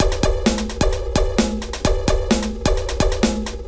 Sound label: Drumkit; Ableton; Factory Packs